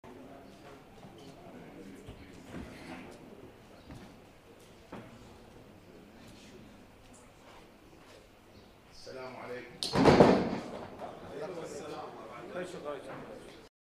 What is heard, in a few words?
loop